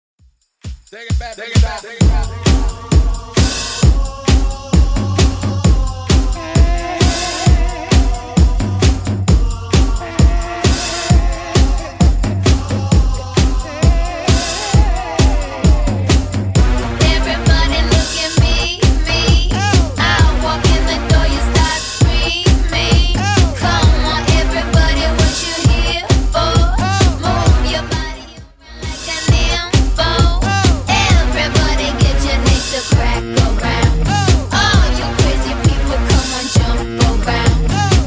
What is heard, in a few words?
2ks Redrum 132bpm